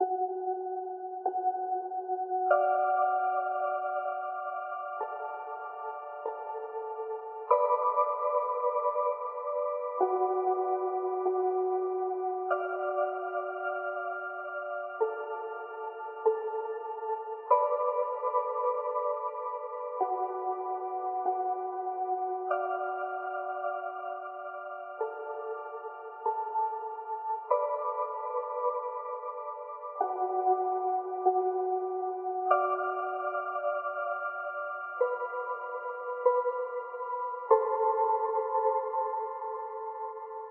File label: dark ambiance; Ambience